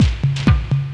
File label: drumloop; awe32